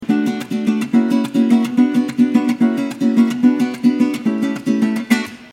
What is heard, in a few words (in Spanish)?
Sonidos Cuatro Relativo Re Sim